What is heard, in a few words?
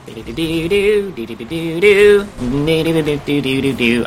fancy honk